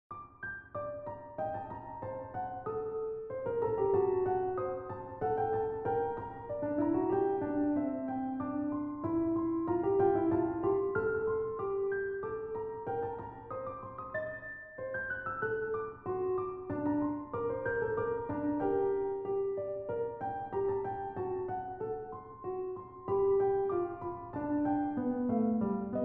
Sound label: G Minor Minuet Piano